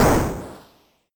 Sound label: enemy explosion; sky knight